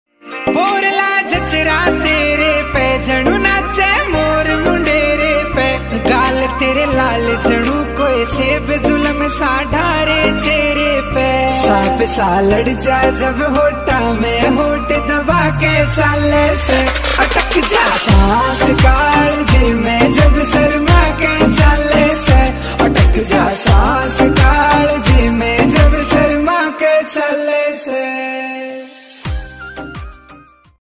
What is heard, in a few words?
Haryanvi song Ringtone